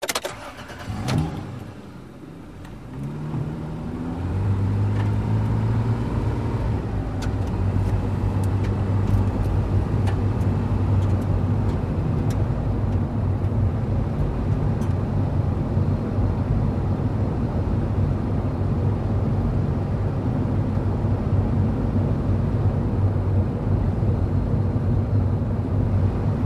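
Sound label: SUV Start Engine Accelerate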